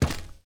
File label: robot step